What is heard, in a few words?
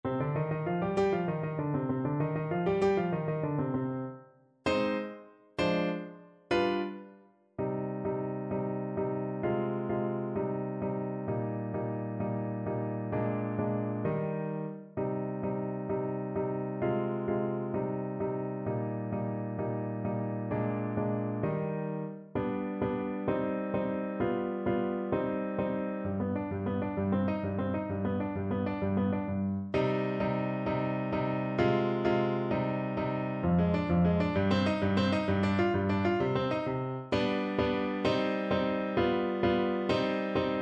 cl pf; burgmuller; tarantella